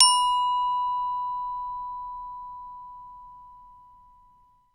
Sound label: BEL ORCH